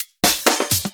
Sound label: Minimal Fills, Minimal House; Minimal Loops